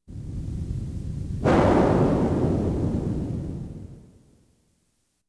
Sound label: Science Fiction effects, Lasers, Light beams